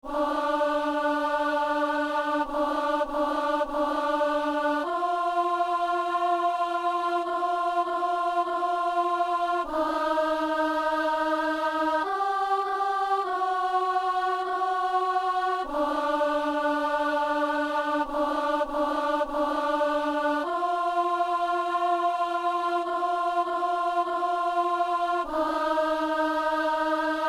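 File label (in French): anamnèse alto; messe de san lorenzo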